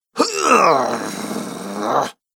Grunts Male Strength, Recorded Voice Sound Effects